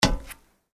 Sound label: Footsteps Iron plate One